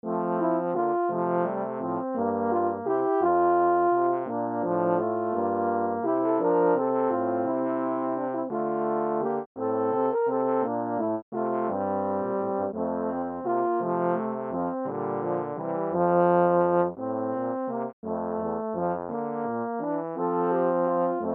courante

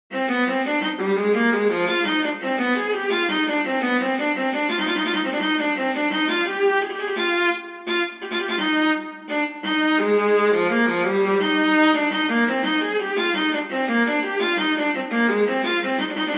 invention c minor for violin viola; bach johann sebastian

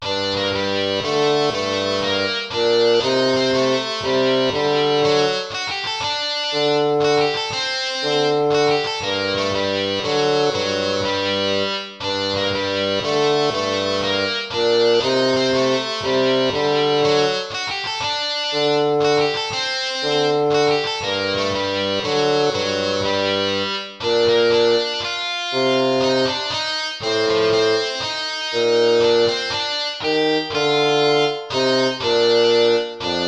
baroque minuet minor

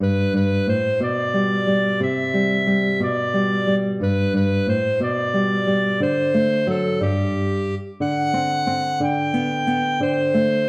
waltz; Carulli Ferdinando

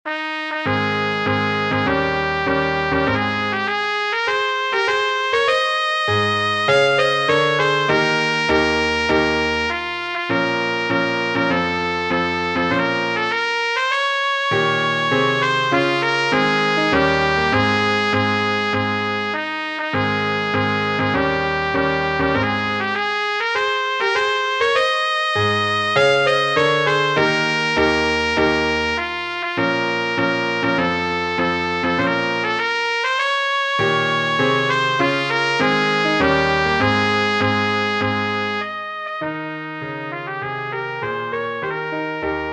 march; beethoven ludwig van

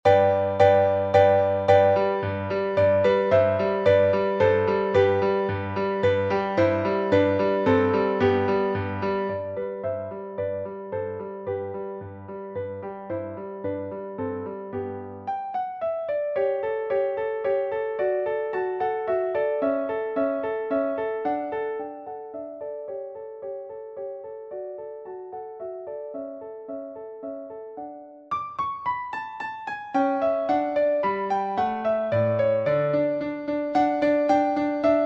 allegro moderato; Camidge, Matthew

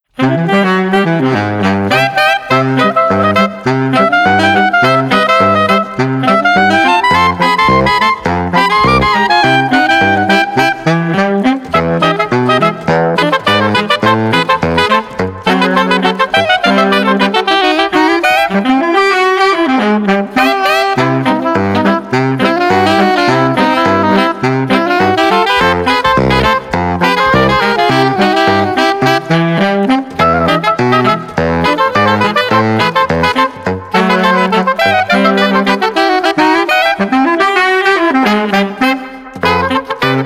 for saxophone quartet; greek salad

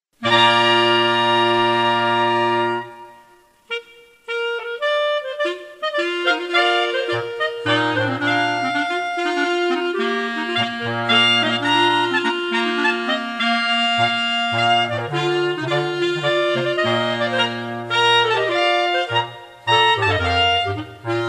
fanfare

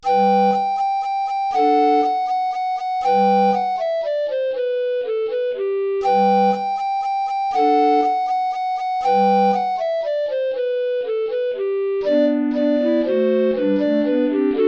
old hungarian dance

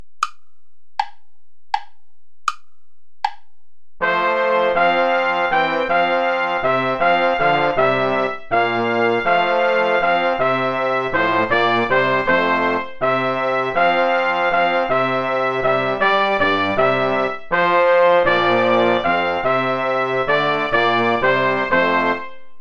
play along without trumpet

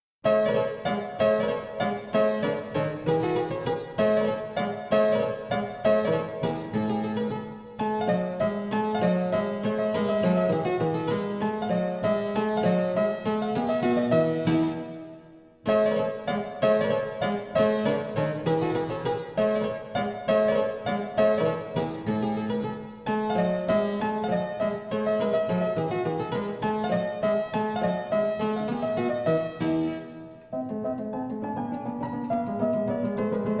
sonata menuet; cimarosa domenico

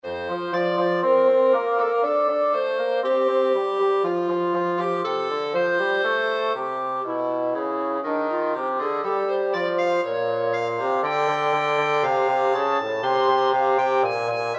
music for two con moto

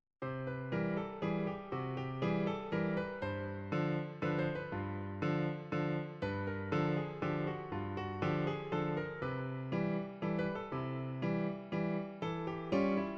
circus valse; holyba ferencc

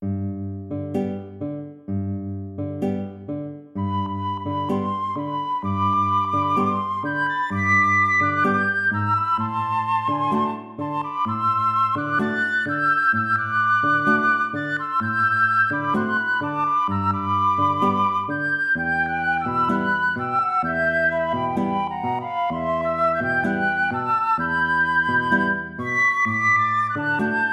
tango

